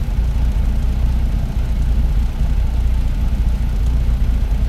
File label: centurion engine rpm 00 load, tanks; engines; War Thunder